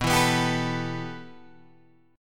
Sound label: B 7th Suspended 4th Sharp 5th, Guitar, Open G